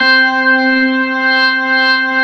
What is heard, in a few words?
HAMMOND; ORGANS; Ragga & Dub Reggae